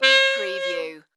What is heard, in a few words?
Sax Sound